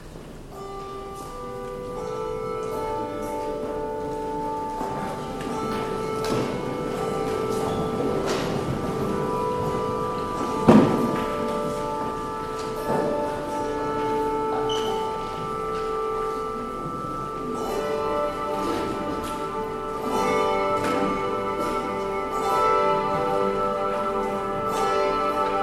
westminster chimes and christ is made handbells and all